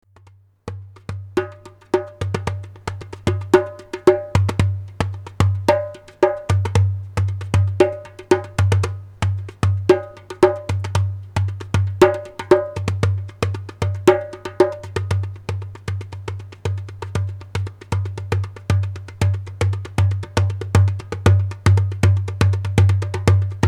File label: djembe